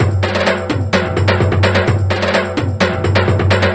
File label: Percussive Loops 128BPM; Vengeance Samples